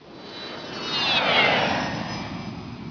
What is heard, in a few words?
takeoff